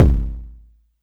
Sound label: BD